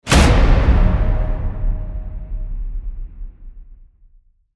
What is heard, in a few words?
Burning Bridge Hit Epic; Ninja Tracks